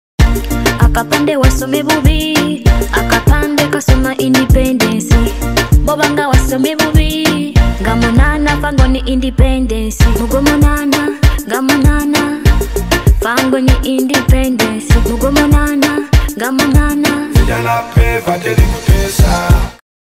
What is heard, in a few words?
Anthem Drop